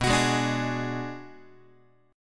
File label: B Minor 6th B, Guitar; Bm6 strummed; x,7,9,6,6,7; JGuitar